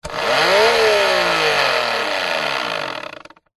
industrial pump electric handheld on off short burst close to motor powerful rev